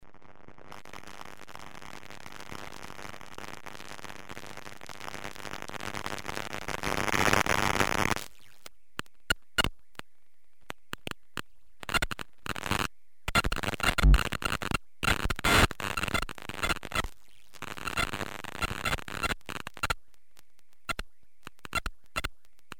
Micronoise bass

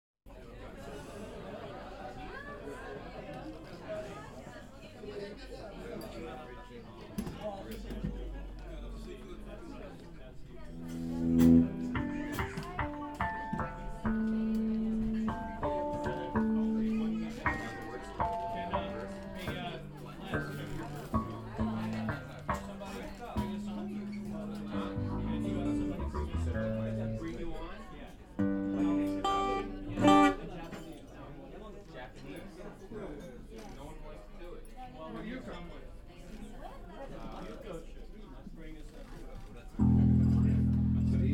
crowd

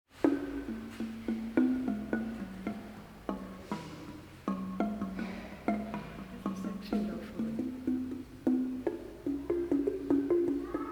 Museum Jingle; Radio Continental Drift; Swakop

